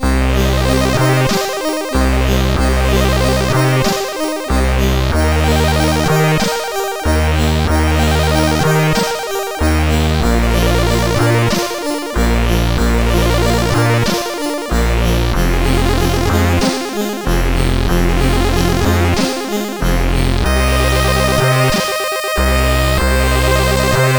sid 8580; Wozzle

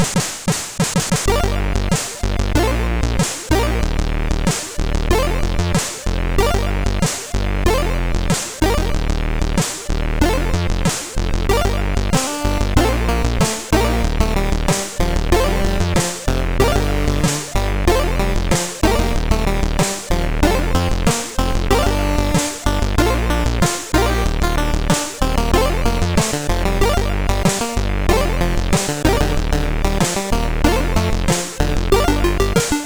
Relaxation, CSG8580R5 sid

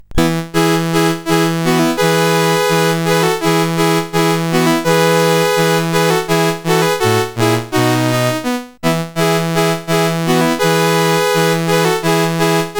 CSG8580R5, GAMES; Woodpecker

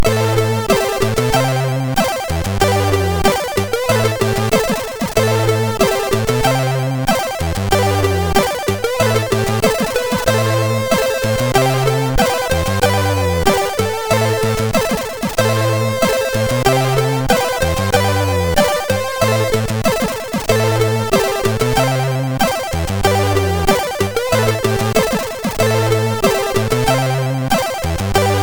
Funky, MOS6581; MOS6581R3; Funky Boy

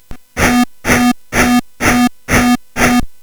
Synth Sample, CSG8580R5; hvsc

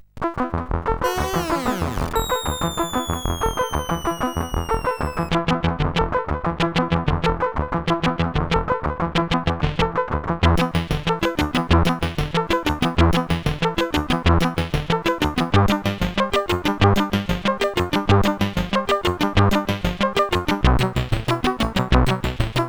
Waves, sid CSG8580R5; Odkin

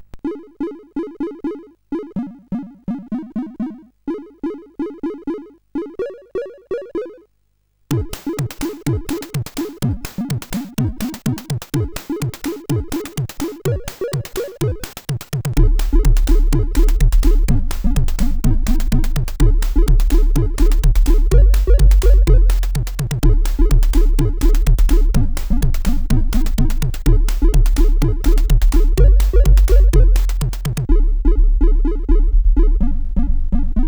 Tune, sid, CSG8580R5

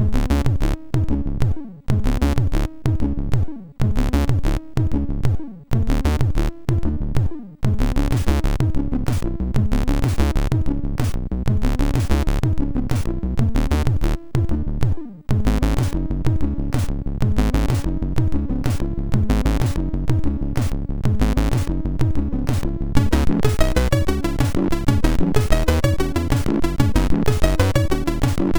sid, MOS6581; Lucid; hvsc

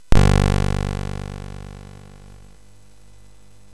Frantic, MOS6581R4

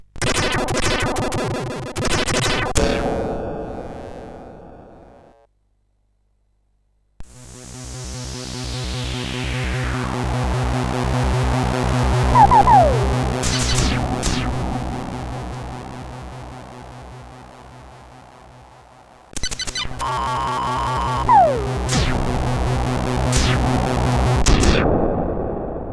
An SFX Song sid CSG8580R5

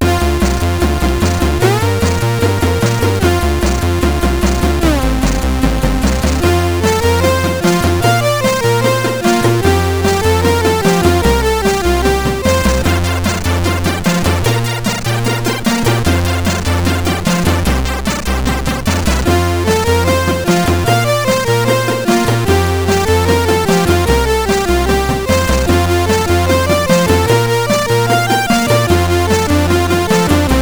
sid 8580; Hyper Cars

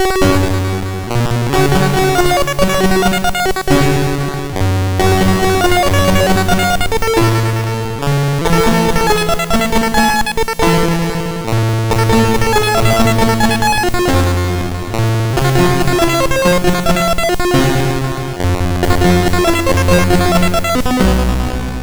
Randomly generated music BASIC, sid MOS6581R2

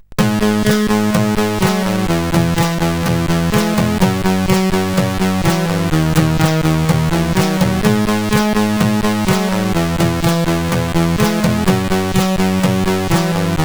Intro, sid CSG8580; Afros

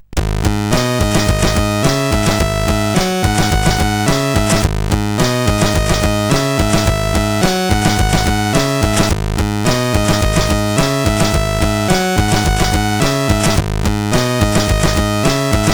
Remix, CSG8580R5

